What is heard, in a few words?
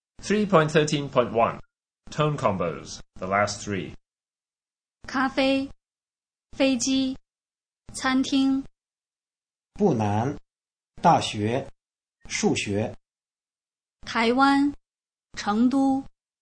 tone combos